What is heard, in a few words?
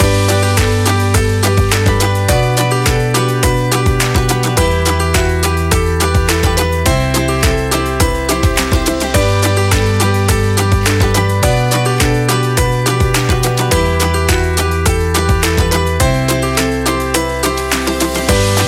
Happy Music Loop